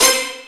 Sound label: Ork Hit C3; Proteus 2000; Digital Sound Factory Proteus 2000